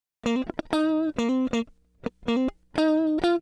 133 Dance El-Guitar S-Note F; Dance DJ

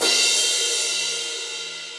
Crash 14, Cymbal; Drums; Drum Hits; Standard Hits; Studio Basic; Session Drums Studio

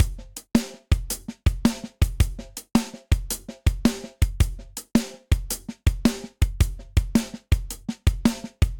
Rolling Triplets Snare Steely Tight 109bpm; Drums; Ableton; Factory Packs; Session Drums Studio

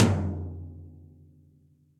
Tom Low Deep Snare Dynamics; Drum Hits; Ableton; Factory Packs; Session Drums Club; MPE Hits

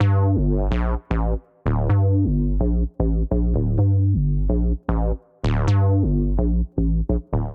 Bass G Minor 127 bpm; Ableton; Factory Packs; Skitter and Step; Ruffin

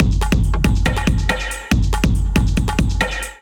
Kit 140 bpm, Drums; MIDI Clips; Bark Park Kit; Ableton; Factory Packs; Skitter and Step

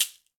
Caxixi Hand; Shaker

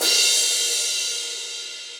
Crash 17 Cold Tight Room, Cymbal; Drums; Drum Hits; Standard Hits; Session Drums Studio

